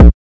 Phat Kick Drum; drums; hip hop; STYLE KITS (Hip Hop, Etc); Khezie Hip Hop Kit

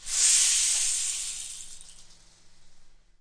rainstick stick short; ETHNIC and WORLD PERCUSSION

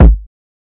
Bonus Kick Drum, Hip Hop; drums; STYLE KITS (Hip Hop, Etc); Khezie Hip Hop Kit

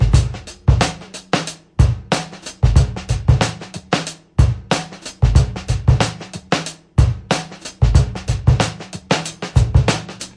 93 bpm funky kit, drum loops and breaks

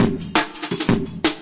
psy break, drum loops and breaks

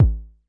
kick, drums single hits; iElectribe Kicks

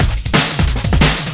break amen, DnB Breaks, 179 bpm; 141 to 185 bpm; Ethex

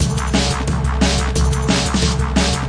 178 bpm angry dnb bass drums

